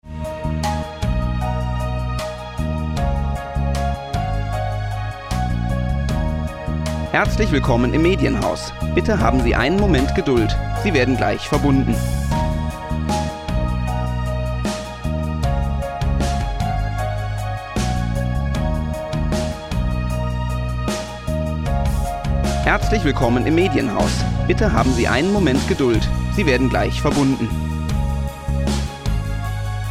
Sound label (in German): Warteschleife; Medienhaus